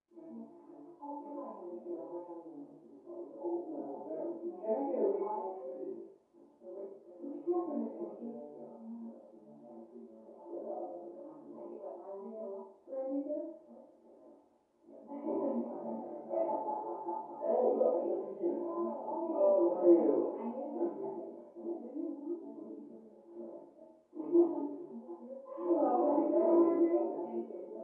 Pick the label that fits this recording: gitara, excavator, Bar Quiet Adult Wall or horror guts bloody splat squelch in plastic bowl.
Bar Quiet Adult Wall